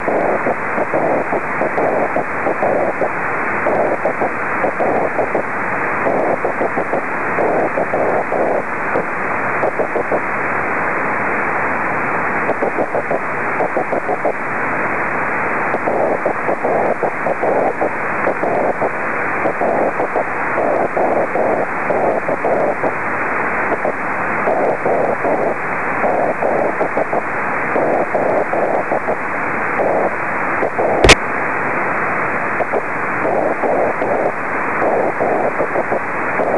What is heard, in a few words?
aurora cw